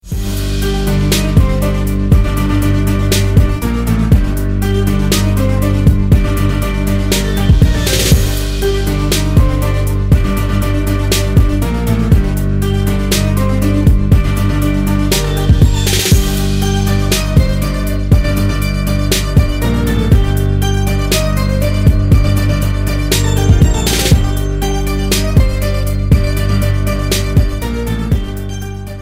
Hip Hop Mix

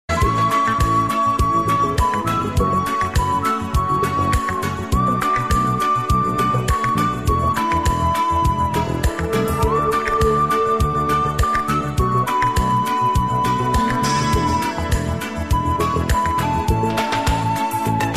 Flute

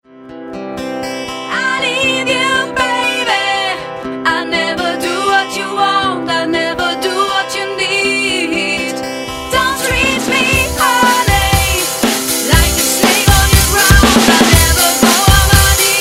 rocking; jazz

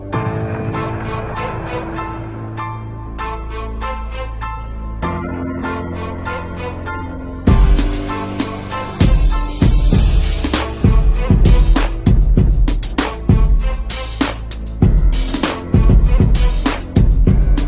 gangsta beat; hip hop

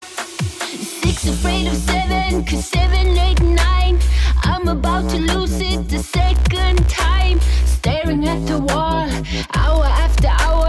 reggae dub

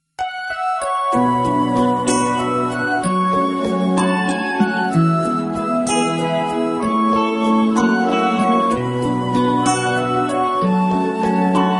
awesome guitar tone; ringtones; rock

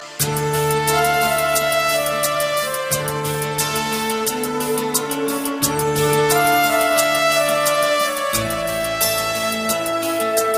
instrumen, ringtones; blues

